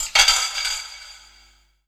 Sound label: TRASH IT, SF EFFECTS; Q Up Arts; Dream Experience